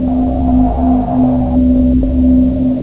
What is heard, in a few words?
drone machine; ambience